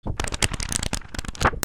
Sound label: OFFICE